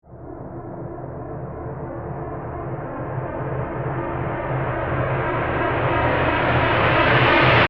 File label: Ramp Reverse Whoosh, Production, Element, Ramp, Reverse, Whoosh, Long; Ascending; Ascends; BlastWave